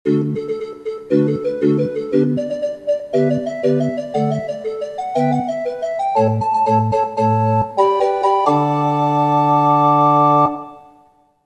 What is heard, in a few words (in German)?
orgelfanfare